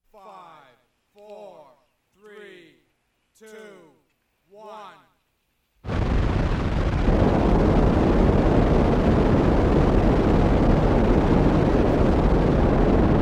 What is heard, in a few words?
Countdown and rocket takeoff